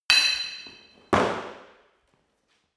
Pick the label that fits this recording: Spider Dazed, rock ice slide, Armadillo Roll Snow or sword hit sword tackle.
sword hit sword tackle